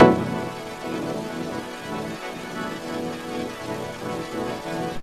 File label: shine